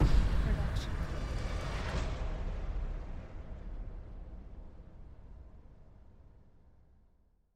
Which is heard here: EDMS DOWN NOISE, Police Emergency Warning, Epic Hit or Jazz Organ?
Epic Hit